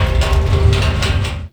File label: Metal Barrel Slide; Ambience; Gameplay; Warframe; Nineteen Ninety Nine